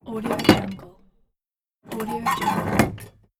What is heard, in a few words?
deadbolt lock